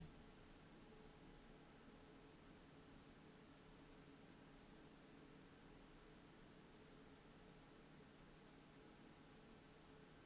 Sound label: mdrill noise